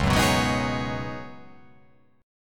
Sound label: C sharp 9th Suspended 4th C sharp down, chord